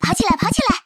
alarm; OnePlus 11 Genshin Impact Edition; xiangling

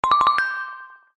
notifications, Cleverer; Meizu; X8

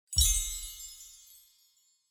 Flip Open; Motorola RAZR 50 Ultra XT2451-4 China

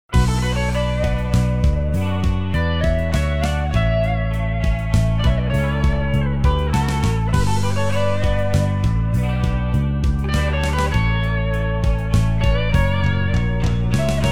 Hard rock background music; Nokia; Audio themes

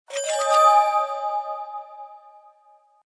Appear

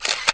camera shutter

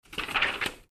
ebook page; OnePlus OxygenOS 13

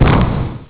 crash; Nokia; 6260; Snake EX

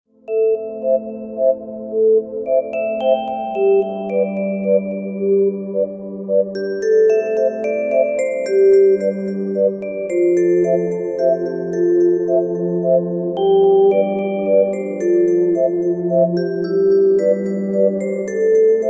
Silent Night, alarms; Gionee; S10

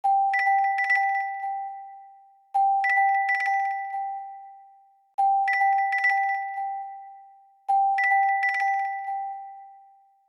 tinkle, ringtones; OnePlus 6

